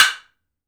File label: woodblock; AKAI S-Series CD-ROM Sound Library VOL-5